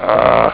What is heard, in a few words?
grrr; ST-XX Amiga Soundtracker Sample Packs